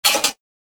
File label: button